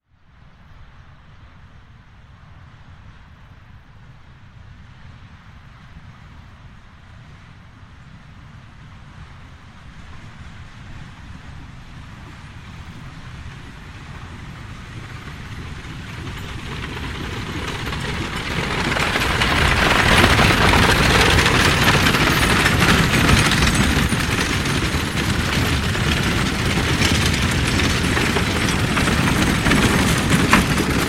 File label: Horse Drawn Carriage And 4 Wag; Horses Carriages and Traps; Hollywood Edge Historical Series